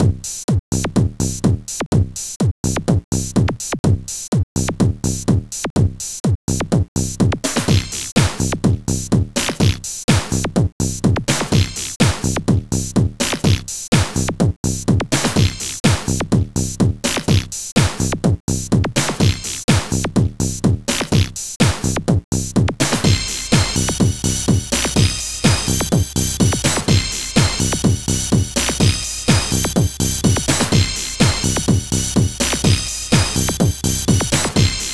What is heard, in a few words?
Hiscore; Slackskin & Flint; Amiga